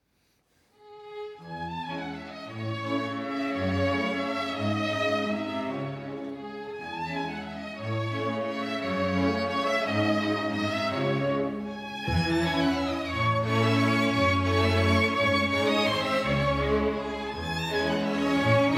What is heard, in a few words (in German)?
serenade e dur tempo di valse; dvorak